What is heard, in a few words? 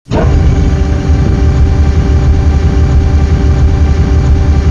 door; cruisecontrol